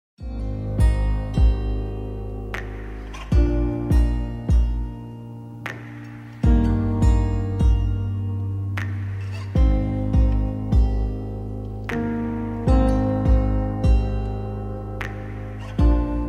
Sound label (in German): akustik session